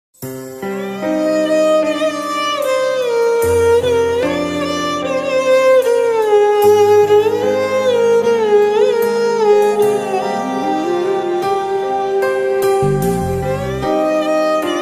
jesus ringtones emotional bgm music christian ringtones